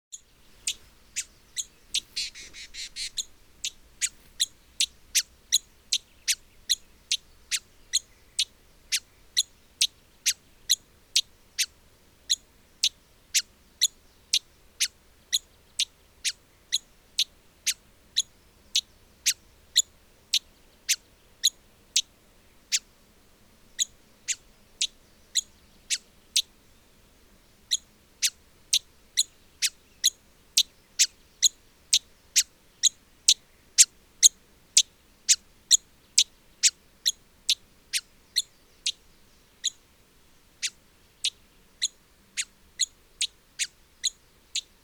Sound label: Hooded visorbearer, پرنده; آفتابگیر کلاهدار